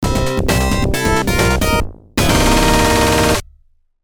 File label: game over